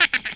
laugh, Brooklyn; 1997 MMX LAN PACK (win 95); Worms Armageddon

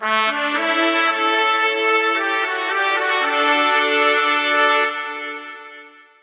Royalty, Fanfare; 1997 MMX LAN PACK (win 95); Worms Armageddon

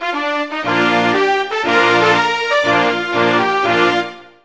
Belgium, Fanfare; 1997 MMX LAN PACK (win 95); Worms Armageddon

